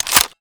ch back, tmp; weapons; Benny Placeholders

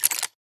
speedloader, weapons; Benny Placeholders; anaconda

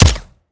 fire supp, glock; weapons; Urban-Coalition; Decay; arccw ud

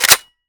bolt drop, mp5k, weapons; Benny Placeholders

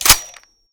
chamber, g36; ARC9 uplp fesiug

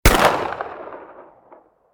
fire, weapons

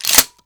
rack, weapons 870; Urban-Coalition; Decay; arccw ud

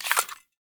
magout, glock; weapons; Urban-Coalition; Decay; arccw ud

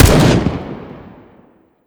fire, m16 weapons

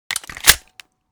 magin, glock; weapons; Urban-Coalition; Decay; arccw ud

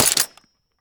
mech; m14; arccw ud; Decay

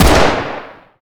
fire, glock; Urban-Coalition; Decay; arccw ud

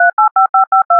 lose

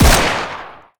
fire, uzi; Urban-Coalition; Decay; arccw ud

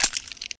speedloader, weapons; Benny Placeholders; nambu

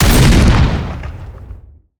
fire, m82; Urban-Coalition; Anarchy; arccw ue

